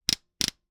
outer cogwheel multiple hammer impacts double-pull downs, Metal Gears Cast Iron; Sound Design Tiedemann